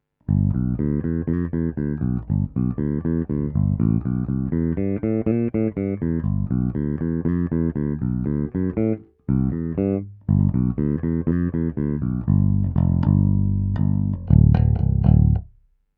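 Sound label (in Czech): H-H prsty, poniklovaná ocel, Roundwoundy, Schecter SLS Elite-5 baskytara; Elixir Nanoweb 45 - 130 Extra Long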